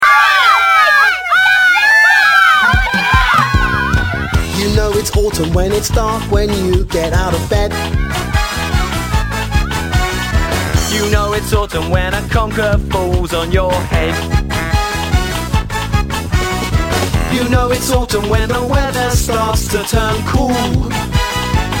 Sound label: Autumn song, children songs